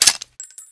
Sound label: pin pull, weapons; valve